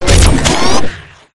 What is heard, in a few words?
nanosuit speed switch; WarGodsShop; cstrike